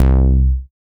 TITE BASS; Masterbits; Dance Trax

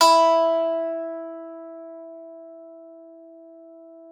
PSAN E4, PSALTER; Xsample VOL-8

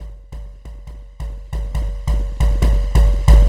drum loop, drum n bass; KJ Sawka - Drum n Bass & Beyond; ACIDPlanet Remix

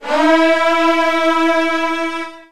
E2, Virtuoso Strings; ILIO; Kirk Hunter Virtuoso Strings VOL-2

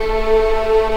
ANGELIC STR, Strings; AKAI S6000 CD-ROM - Volume 1

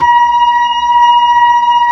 B-3, Blues

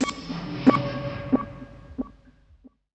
X MACH, MACHINES, Production Effects; Best Service - XXL Production Effects

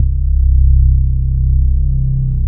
bass, 165 BPM, drum & bass; Ueberschall; Drum & Bass Resonance

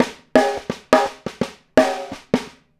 Snare Pattern, Drums; Essential Percussion

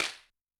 Buzzy Wak, Yamaha DTXpress-II snare

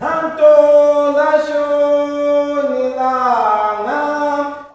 MALE CALL; Spectrasonics; Heart of Africa 2

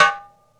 Rocket Tom, Tom One-Shots; KJ Sawka - Drum n Bass & Beyond; Drum Tool Box

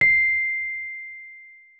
Rhodes MK1 C7; Xsample VOL-1

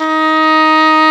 English horn D#3; InVision Interactive; Lightware VOL-1; Instruments & Percussions

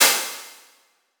hithat crash cymbal

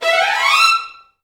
VI RNMI E5, Advanced Orchestra; Best Service

